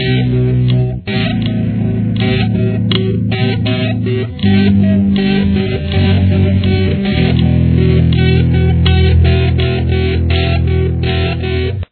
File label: slow, guitar